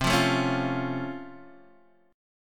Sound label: C sharp Augmented Major 7th C, chord